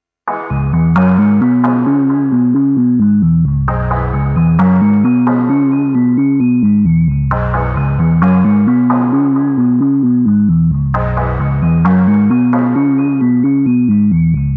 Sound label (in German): FM Sequenz